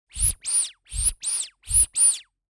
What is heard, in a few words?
Rubber duckie toy squeeze and squeak